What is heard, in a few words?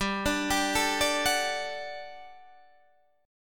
G Major 7th Suspended 2nd G, Guitar Standard; GM7sus2 arpeggiated